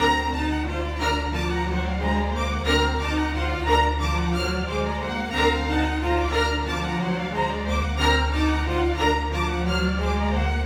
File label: Strings; Sonic Foundry (Sony Creative Software); Orchestral VOL-2; Modern 07